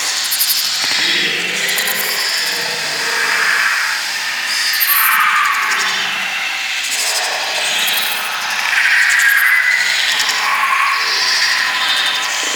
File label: Necro Clone The Dead Loop A; Warframe